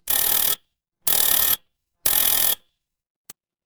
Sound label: office alarm clock